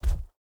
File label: bear footstep dirt hard; Rust